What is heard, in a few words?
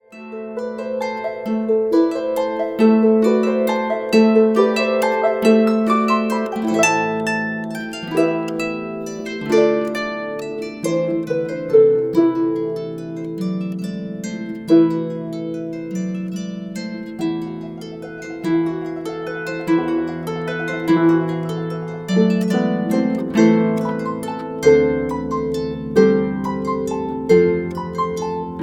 Carol of the Bells Duet